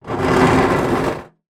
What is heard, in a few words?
small metal case tin drag slide on concrete; foley; zapsplat